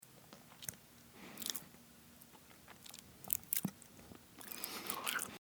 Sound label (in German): Keks essen